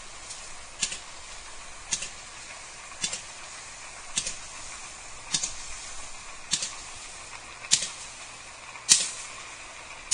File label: sperm whale; limekiln